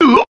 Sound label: "ulp"; ooh ahh; human